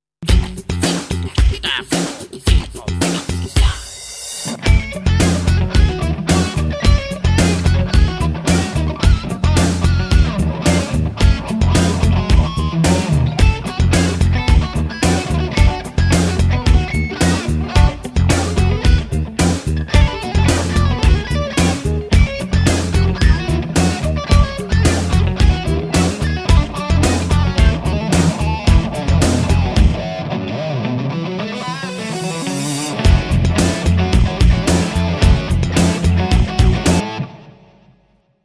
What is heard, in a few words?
funk